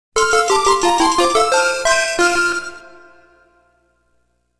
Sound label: Fanfare